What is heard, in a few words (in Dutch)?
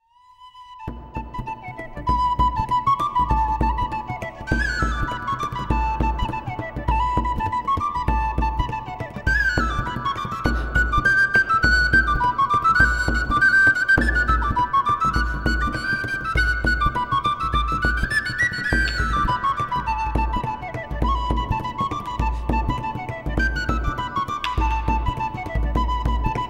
fluitsolo en budhran; irish leen